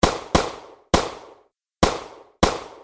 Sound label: 38 Caliber Gun Shot 5x